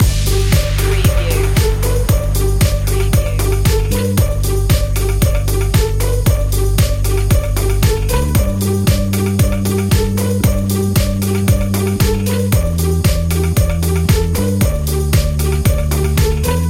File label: storyteller loop